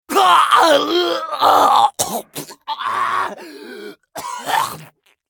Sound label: scav death; eft